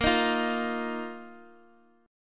Bb Suspended 4th Bb down Guitar Open D

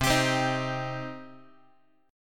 C Minor C down Guitar; strummed; JGuitar

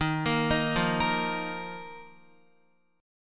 Eb Suspended 2nd Guitar, chord; Ebsus2 arpeggiated; JGuitar

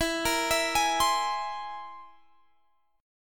C Augmented 7th E

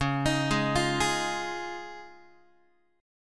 C sharp Major Add 9th, Guitar; C#add9 arpeggiated; JGuitar

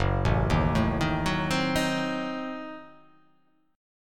F sharp Diminished 7th F sharp

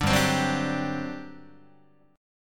G Major 7th Suspended 4th, Guitar

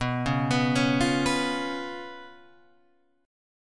B Suspended 2nd Flat 5th, Guitar, Open G

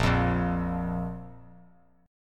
A sharp 5th A sharp; strummed; JGuitar

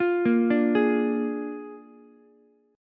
Bb 7th Bb down Ukulele; arpeggiated; JGuitar

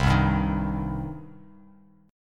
C Minor C down Bass Standard; strummed; JGuitar

